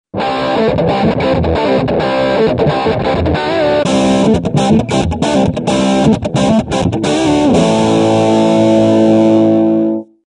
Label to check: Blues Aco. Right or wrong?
Right